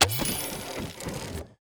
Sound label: spin Button; netent